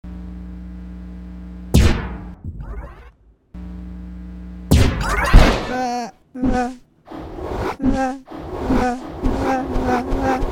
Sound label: Portal Gun; Stealing The Diamond